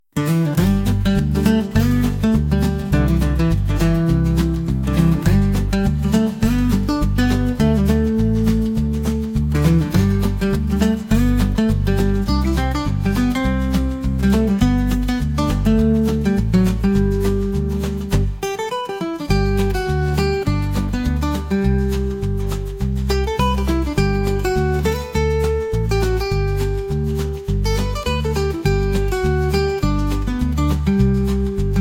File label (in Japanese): アコースティックギター不気味